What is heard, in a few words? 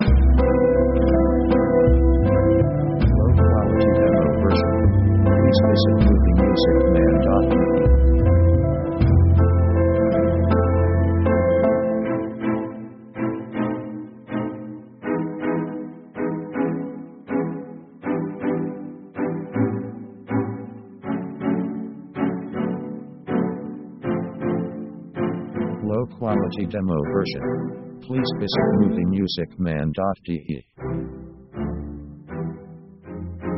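orchestral 70s modulation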